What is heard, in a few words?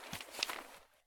prone gravel; Escape from Tarkov